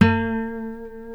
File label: B NYLON, Guitars